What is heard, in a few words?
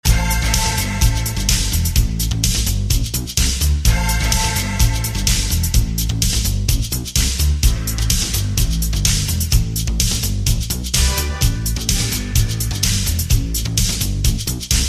toy music menu loops; bs zelda ast msu1 project audio edit; Memes